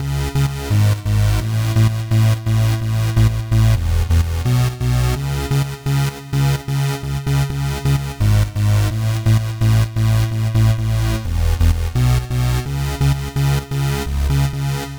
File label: low synth, Key A, Bpm 128, Big Room House; Loop Nerds; Big Room House Allstarz